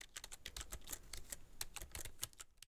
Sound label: keyboard